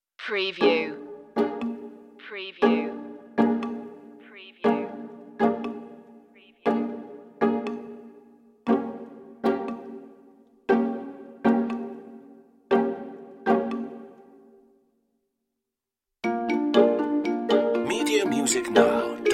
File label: no bass and perc